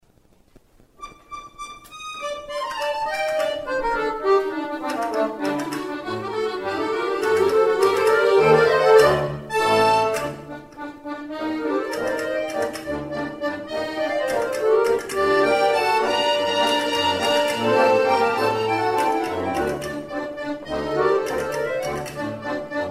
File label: Tango Sevilla